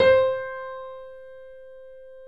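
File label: C4, Bösendorfer, 3D Pianos; E-MU Producer Series Vol. 5; 3-D Audio Collection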